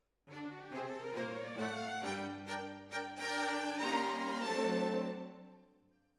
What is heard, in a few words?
Decca, Jazz for piano